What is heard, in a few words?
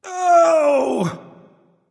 ouch; chiron